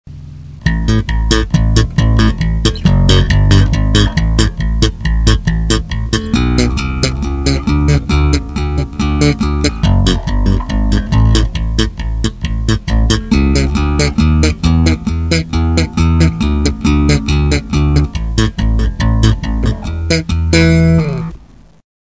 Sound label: Bassgroove